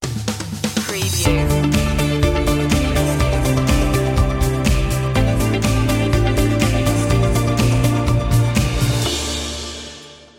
summer chill stinger; media music now

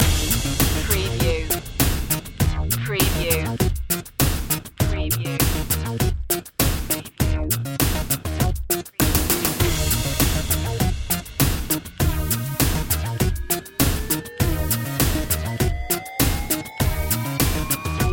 deep impacter loop

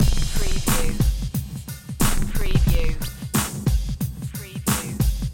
Hip Hop Drum Loop 90 BPM - Swing With Crash

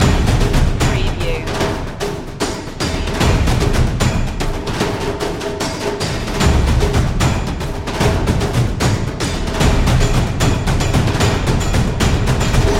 dramatic percussion loop

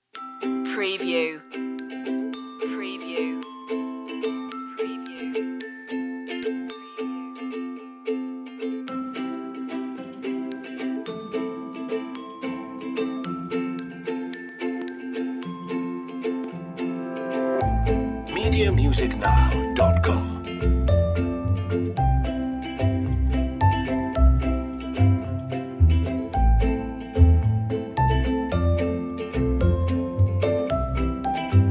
take my hand no percs

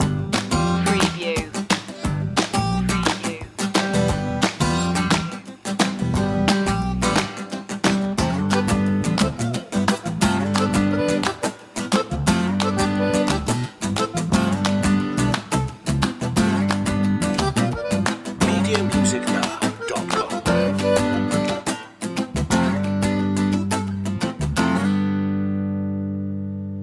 creole family warm up

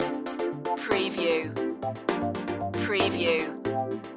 house chilling loop

